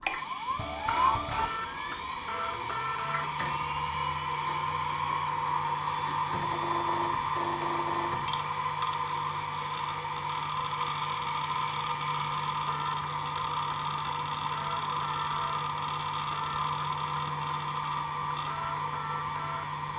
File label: Startup; Desktop Sleeve Paint Startup; Rhythm Doctor